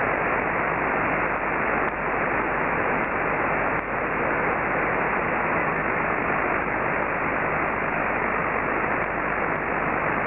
on 20m vy weak; meteor scatter mode; HA7UL

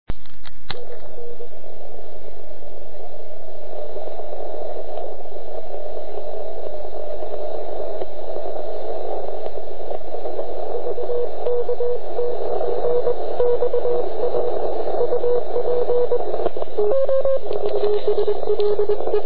FT857 CW Filtr; ok1if

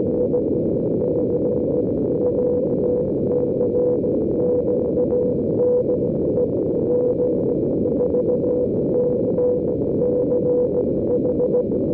CW; G4CCH; Project Moonbounce

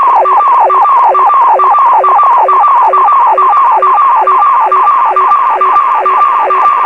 sstv

